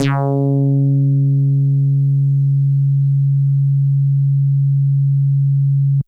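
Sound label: Synthe Bass DX100 C#4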